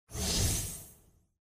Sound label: "shhh"; The Witcher Hunt